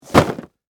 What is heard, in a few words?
Foley, Cardboard, Box, Drop, Set, Down, Full